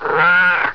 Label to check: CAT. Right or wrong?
Right